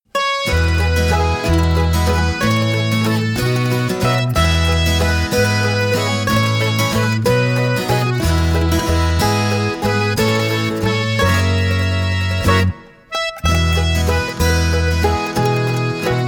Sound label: Sea Shanty Playoff, rehearsal tracks